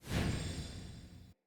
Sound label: UI Progression Close